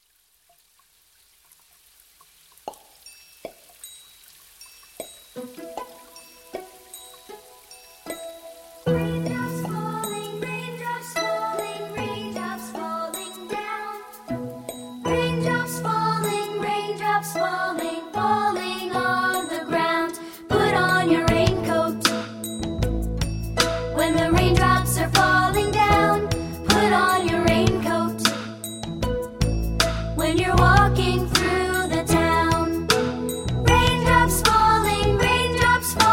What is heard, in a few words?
Raindrops Falling